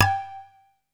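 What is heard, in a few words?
PERC 3 G4, percussion; SEQ AND PERC; Music Store - Claudius Diemeter Schwerlos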